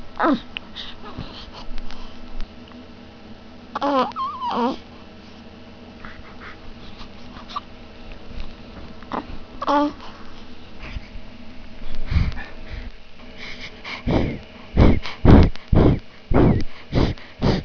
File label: BREATH